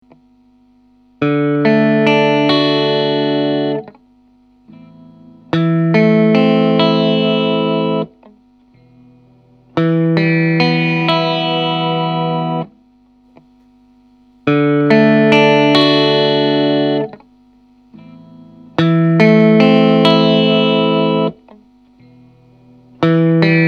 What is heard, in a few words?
2 Mic Gibson; SSL 2